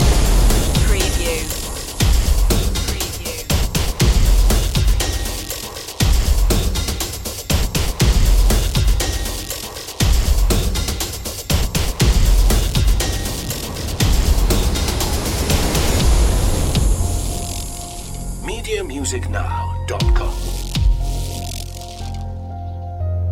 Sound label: monsters of war loop